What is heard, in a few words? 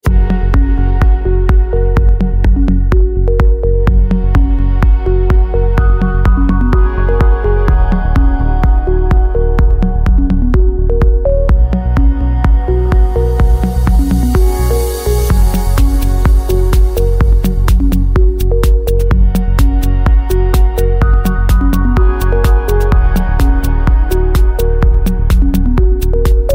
chillout electronic music, ringtone